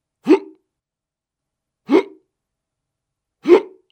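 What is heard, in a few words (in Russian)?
звук икоты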